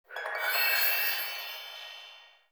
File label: HAPTIC POWER ON ANIMATION; Sharp AQUOS sense lite SH-M05